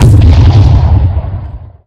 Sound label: Meteor